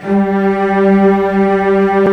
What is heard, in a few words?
Cellos; CMI